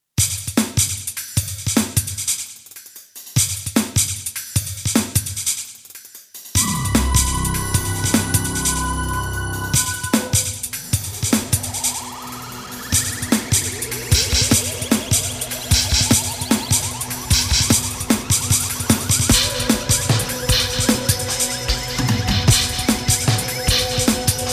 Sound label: loop rhythm synth bend octave drums; songsnacks